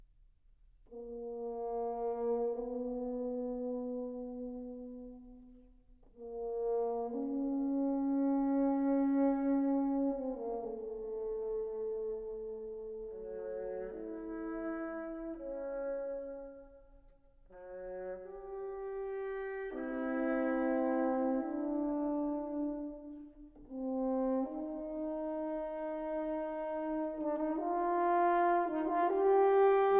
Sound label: Quartet for Horns, Adagio